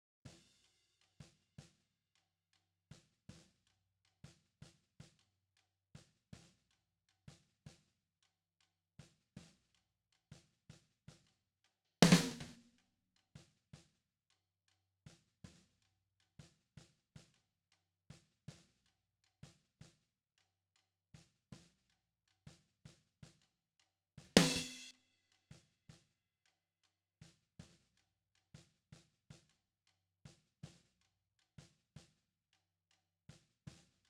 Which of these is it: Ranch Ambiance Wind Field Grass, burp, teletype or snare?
snare